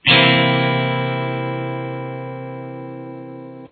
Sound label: c dominant 7th open, guitar